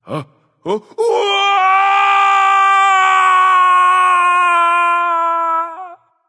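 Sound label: nonverbal fear; smite; voicepack; merlin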